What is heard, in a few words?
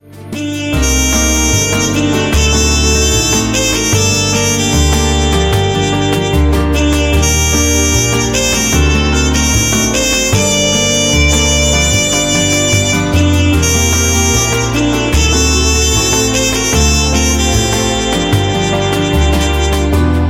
Posanova nostalgic Era, RINGTONE; MIUI14 and HyperOS 1.0